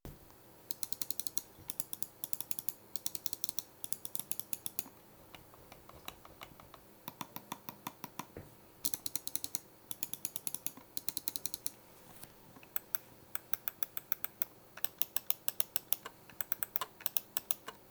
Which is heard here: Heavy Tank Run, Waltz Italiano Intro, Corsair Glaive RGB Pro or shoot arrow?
Corsair Glaive RGB Pro